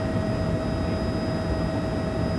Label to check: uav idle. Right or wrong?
Right